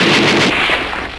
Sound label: rifle